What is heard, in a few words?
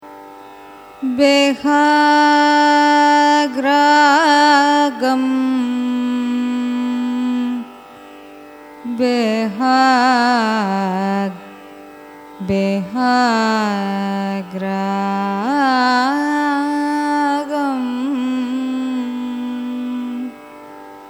raga behag signature, carnatic music